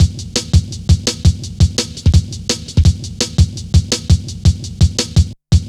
Steppy, Jungle, Drum Loops 168.5; Zero-G Jungle Warfare 1, 2 & 3

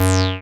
SYN TBM G3, MC202; Sound & Vision - Gigapack I CD 2

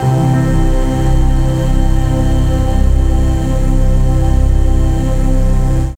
MELLO NOTE, DRONES & PADS

